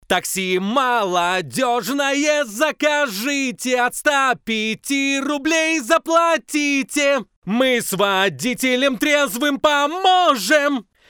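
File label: vocal; Taksi Molodezhnoe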